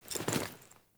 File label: Female Foley Action Default Dodge, Default Clothing; Characters; Player